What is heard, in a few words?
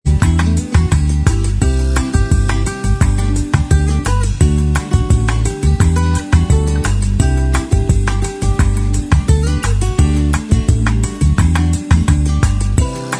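bossa mix instrumental track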